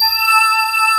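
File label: ambient pad, synthesizer; AKAI S6000 CD-ROM - Volume 1; DIGI PAD FX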